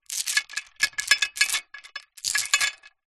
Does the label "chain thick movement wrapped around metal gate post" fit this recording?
Yes